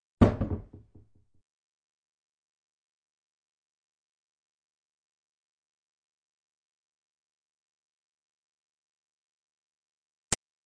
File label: Track, Doors; 헐리웃특수효과음; 문 소리